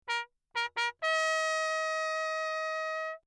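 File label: trumpet mute Lechner signal; Perinétventiltrompete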